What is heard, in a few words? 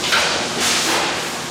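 machinery out loop; Garry's Mod; tf2; cp harbor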